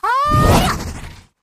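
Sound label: free spins pink light attack; wild worlds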